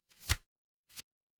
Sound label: paper fabric page turn